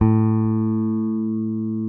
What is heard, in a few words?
P BASS SUSTN MP A2; Sampleheads; Will Lee Bass Library